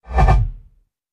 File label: Bass Impact Double